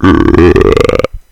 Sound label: pain; HALFE LIFE 3